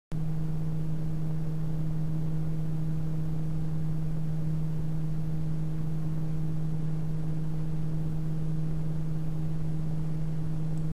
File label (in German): Be Quiet Silent Wings 2 135mm ohne Kuehlkoerper 12V